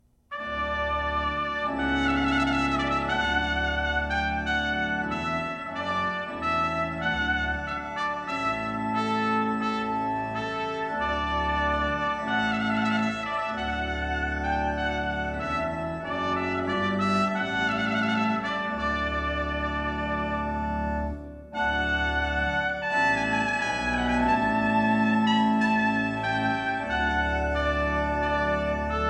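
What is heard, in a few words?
Trp Vol with Organ; Clarke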